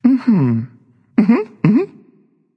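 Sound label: non verbal agree, voice; cernunnos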